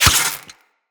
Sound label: Melee Dagger Impact Robotic Flesh